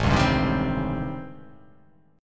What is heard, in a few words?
A 7th Sharp 9th Flat 5th